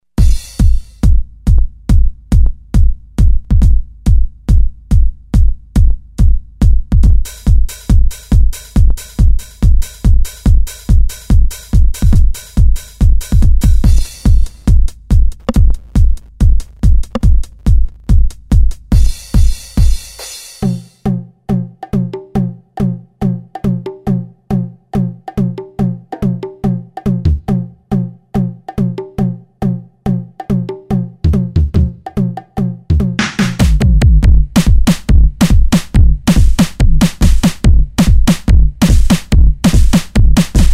4 on floor kit; Roland SRX-05 Supreme Dance

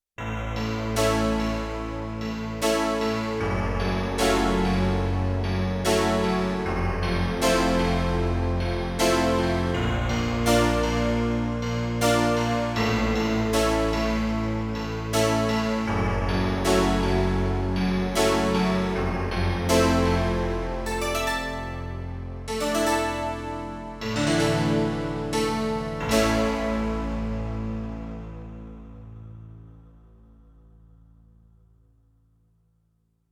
Filtertype Harpsich; Yamaha TX16W; Spacey Keys 2

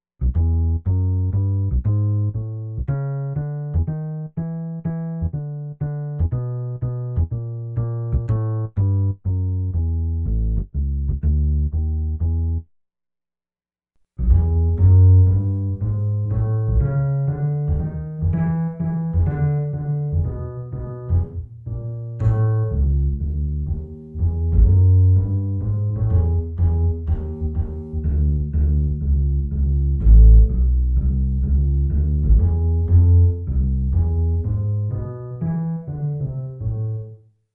MEDIUM ROOM II

